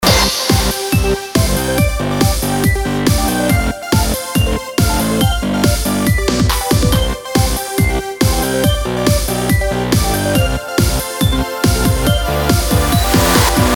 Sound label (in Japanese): 素サイン波加工後伴奏